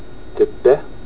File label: sheep